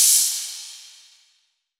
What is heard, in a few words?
Crash, cymbals; Drums; One Shots; Crashes; House